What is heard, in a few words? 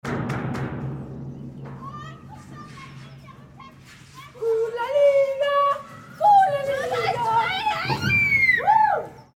children screming in a tube